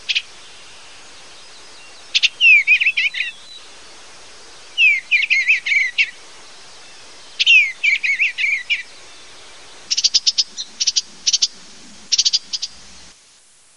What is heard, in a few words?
Eastern Bluebird